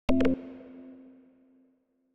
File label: Cursor Click, Sci-Fi